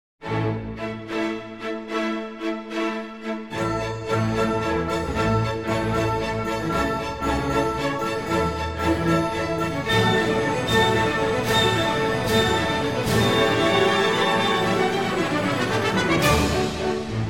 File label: Waltz